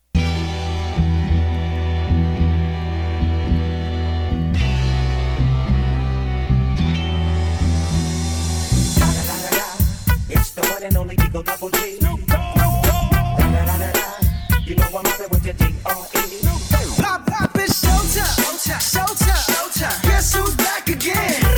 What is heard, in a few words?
Pre Pool Party Mix